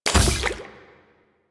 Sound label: Crafting Equip; DE; Warframe; Deimos